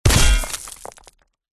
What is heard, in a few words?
impact metal object drop heavy smash into ground debris stones